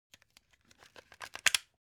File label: object household plug unplug clatter